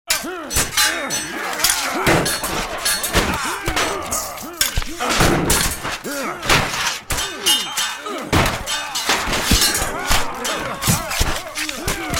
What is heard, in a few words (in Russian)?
krupnyj plan bitvy s golosami